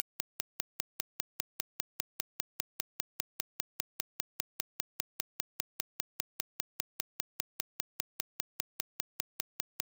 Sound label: impulse train 5hz